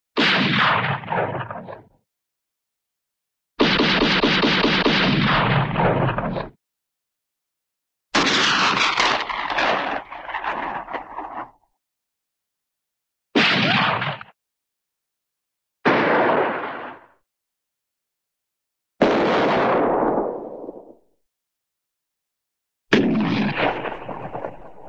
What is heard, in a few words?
gun, rifle single shot; cartoon